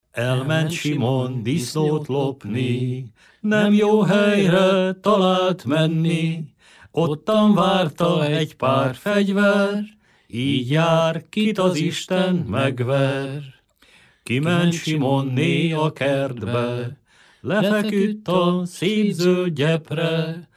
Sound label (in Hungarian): nepdal; elment Simon